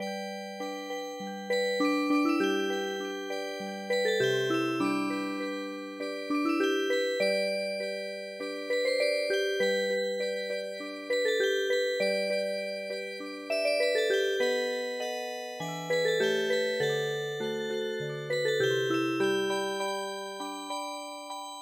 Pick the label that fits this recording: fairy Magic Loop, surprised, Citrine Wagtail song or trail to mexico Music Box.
trail to mexico Music Box